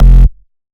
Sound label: Bass F, Bass Synth